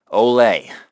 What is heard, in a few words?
band